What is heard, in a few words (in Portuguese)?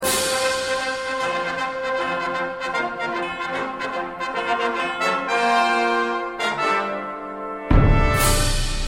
trompete